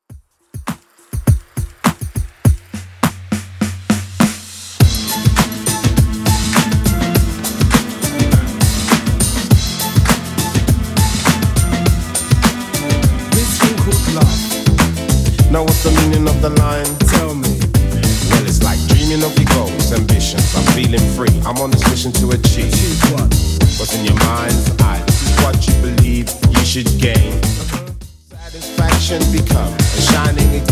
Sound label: 90s Redrum 102bpm